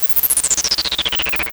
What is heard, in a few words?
Synth FX